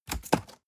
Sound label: cardboard box close fold scrape short